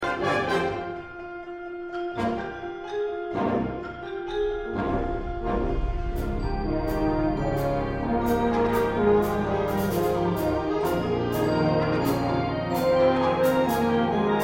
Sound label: Wind Ensemble